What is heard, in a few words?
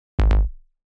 Progressive Psy Bass